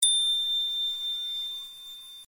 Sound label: Magic Swing